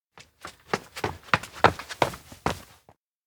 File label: steps indoor wind corridor run up; anemoi パッケージ版 1.1